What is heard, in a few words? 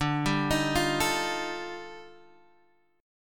Eb Major Sharp 11th D